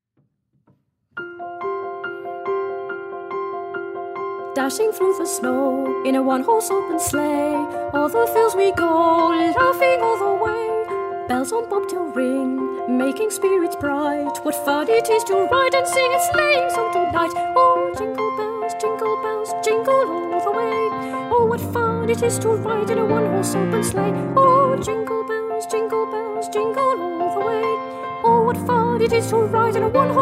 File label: Jingle Bells Full Track